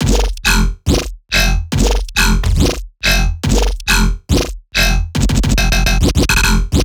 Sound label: Riddim Bass Loop F# Crank 140; Ghosthack; Riddim Essentials 2020